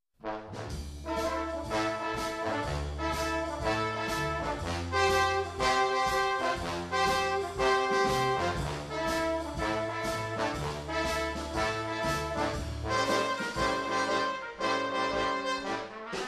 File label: Swing Jazz Combo; Premier